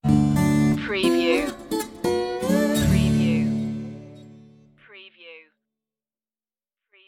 loosy bluesy stinger

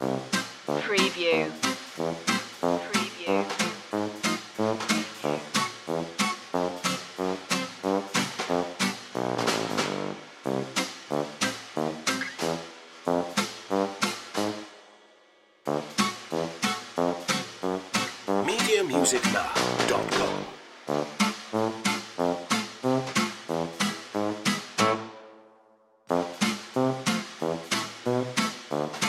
dewdropper loop

